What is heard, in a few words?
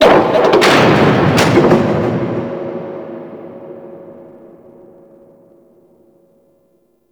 Fortress Distant Gates; Warframe; Ambience; Grineer Fortress